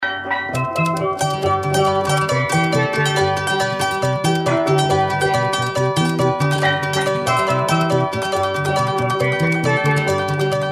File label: music